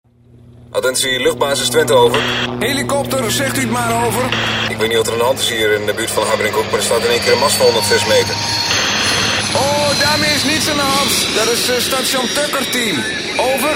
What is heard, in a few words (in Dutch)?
Jingle; Audio Fragmenten; Arkan Radio 2